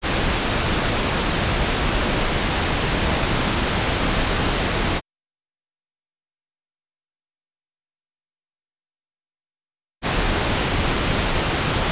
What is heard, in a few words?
Pink noise In Out of In phase